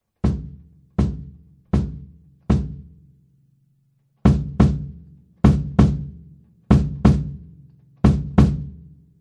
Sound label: kick port